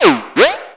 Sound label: moog